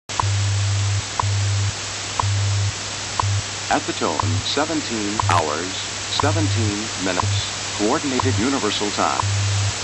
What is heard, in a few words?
distorted hours